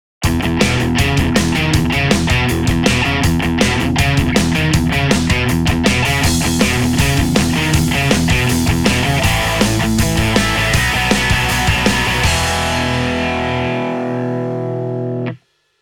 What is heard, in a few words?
guitar double mono